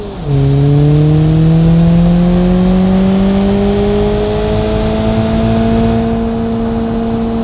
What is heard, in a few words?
second, vehicles; ls500